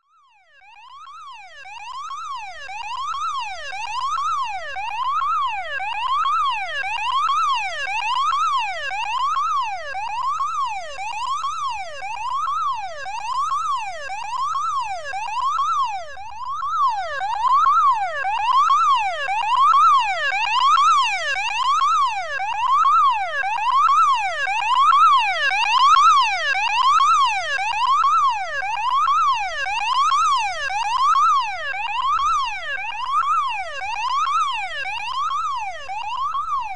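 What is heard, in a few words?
Alarms, Electronic Alarm, Urgent; BBC Sound Effects Library; Explosions Guns Alarms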